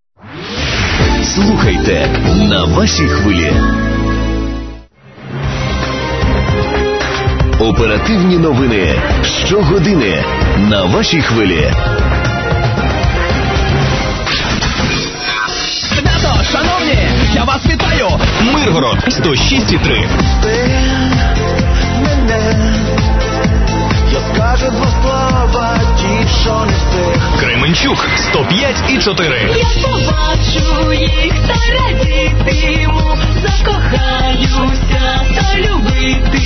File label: interval signal; ur poltava vasha khyvlia